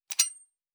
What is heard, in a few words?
Metallic Gear